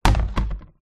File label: plastic washing basket tub down on wooden floor; foley